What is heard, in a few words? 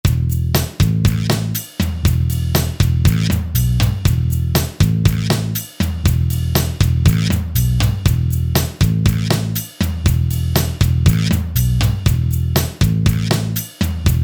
Pop